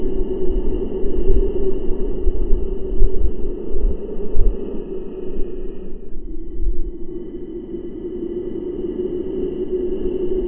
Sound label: void